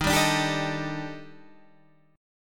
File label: D sharp Major Sharp 11th D sharp Guitar DADGAD, chord; D#M#11; strummed; 13,10,12,12,12,13; chord shape